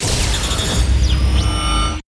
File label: Arena Battle Lost